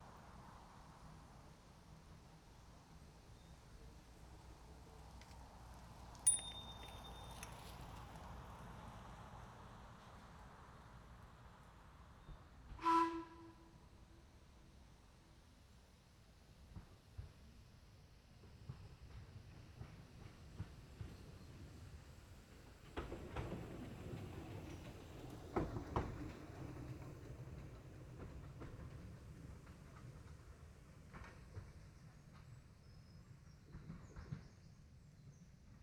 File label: Vehicles Steam Train Passing By Stereo Binaural